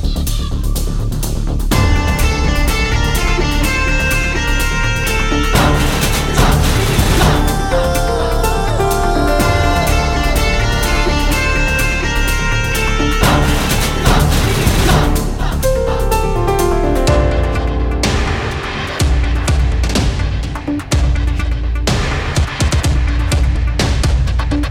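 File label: BG Music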